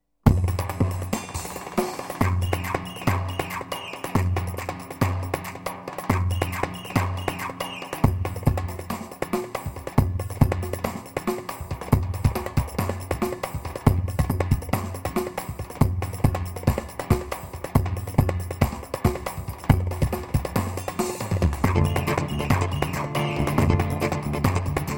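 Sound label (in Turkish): pa 500 tr roman ritm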